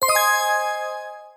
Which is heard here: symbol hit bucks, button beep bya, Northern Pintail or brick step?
symbol hit bucks